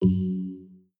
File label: Lock